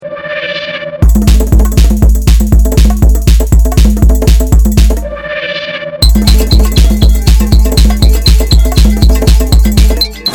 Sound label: FUNKY D&B